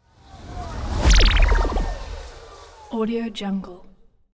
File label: magic warp personal jump wobble